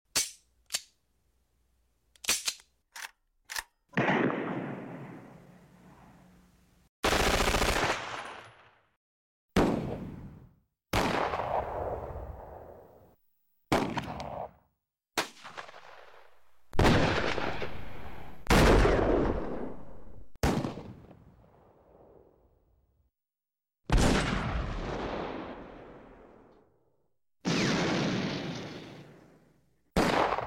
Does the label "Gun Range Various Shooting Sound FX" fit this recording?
Yes